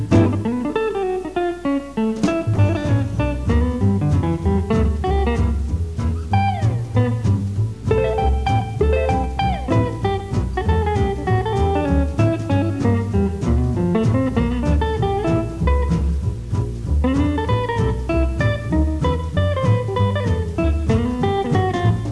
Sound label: solo, pickin